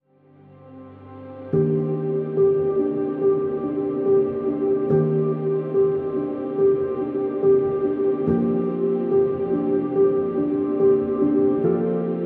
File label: Piano and Orchestra Only